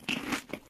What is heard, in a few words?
eating